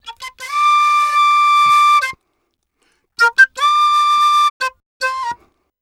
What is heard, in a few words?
Penny 075bpm Bb, Penny Whistle, African; Quazibeat; African Secret